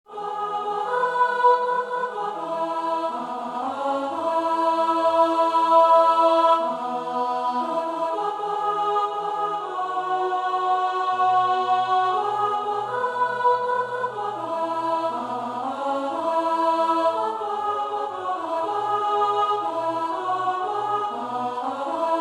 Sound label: Roll you sweet rain Tune